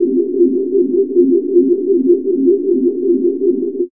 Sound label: weird f; Chillout (ambient1&2)